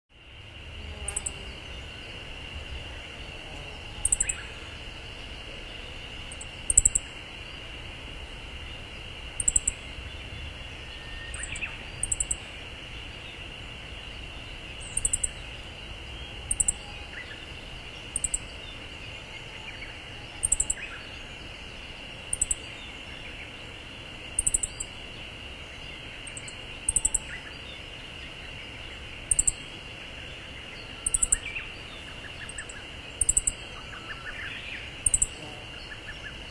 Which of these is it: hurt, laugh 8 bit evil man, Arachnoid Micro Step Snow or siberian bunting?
siberian bunting